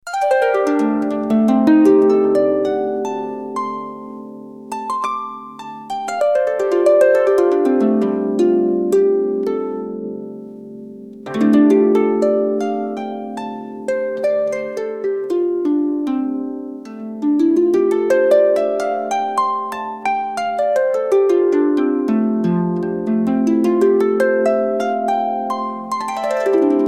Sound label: jaw harp